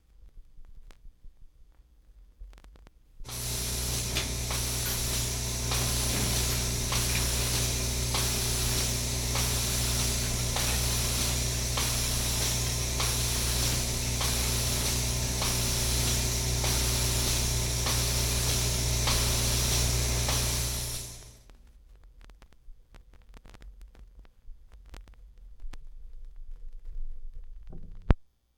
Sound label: Milking Machine; Jac Holzman; Authentic Sound Effects Volume 2